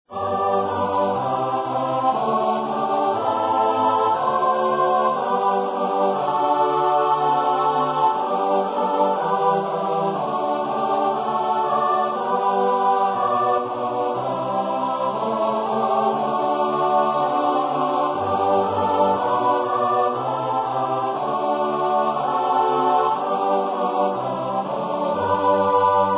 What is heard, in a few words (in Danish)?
Kor G dur Et vers; Kosmologernes travetur